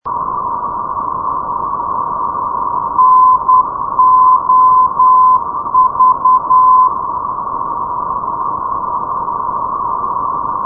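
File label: ndb novara-malpensa, non-directional beacon; sdr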